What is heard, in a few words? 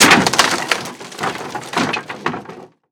Impact Wood Breaks And Falls